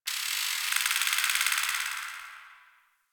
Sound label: High Mechanics; Warframe; Guild